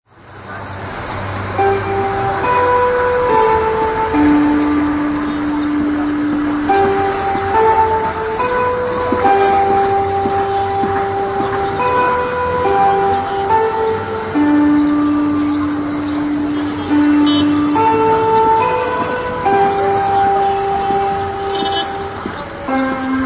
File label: Clocktower Darjeeling; india; terratravel